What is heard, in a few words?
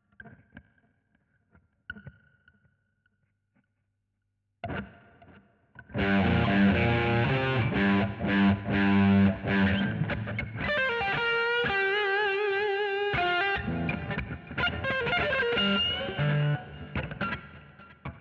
GTR B Solo